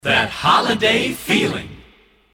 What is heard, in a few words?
christmas kit group shout that holiday feeling